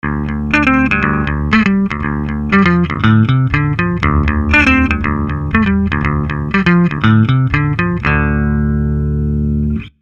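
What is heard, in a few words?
Fame Baphomet Gothic, guitar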